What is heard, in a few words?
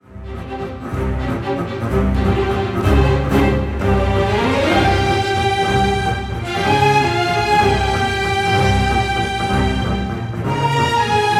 strings only, strings; Virtual Orchestration